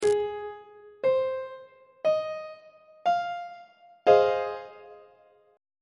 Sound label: E sharp minor 7th 1st inversion on treble clef